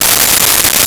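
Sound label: Ratchet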